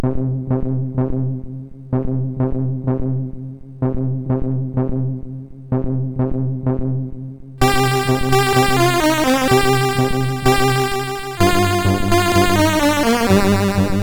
Music Samples, sid, MOS6581R2

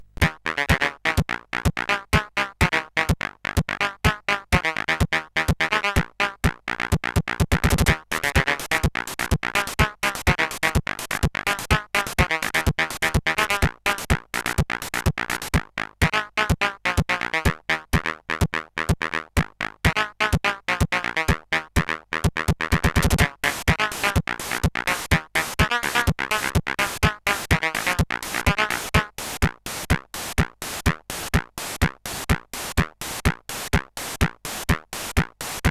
Techno Playtune, CSG8580R5

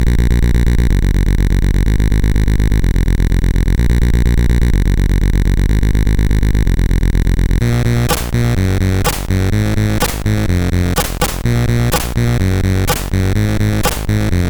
sid MOS6581R4

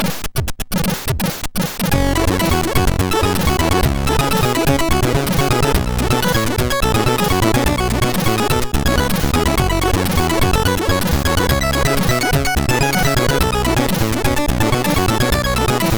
Soaring Funk o Matic, MOS6581R3

